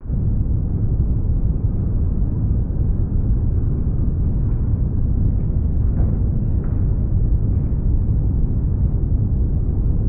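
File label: Ambience design Otherworldly Gloomy Industrial Ambience Em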